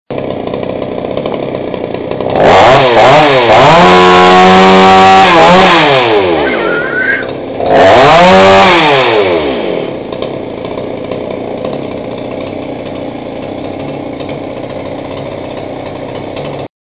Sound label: motorka